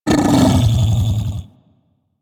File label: Feral Vocal Downed Get Up; Warframe; Kubrow